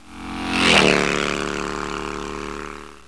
cycle by; Bike passing yah; 'Nother bike passin' yah

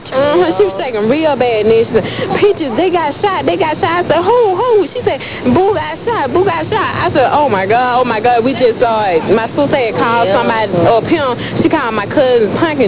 boo

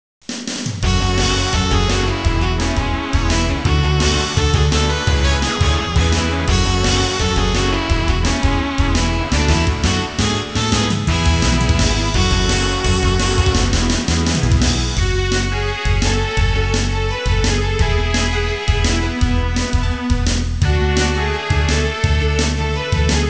fight music

